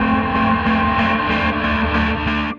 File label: Processed Guitar, Loops; Guitars